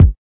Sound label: kick